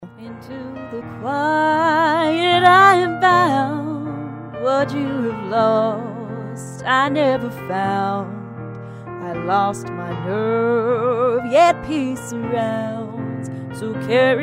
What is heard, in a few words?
Singing Audio